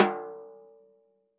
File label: natural sd rim off, snare; single hits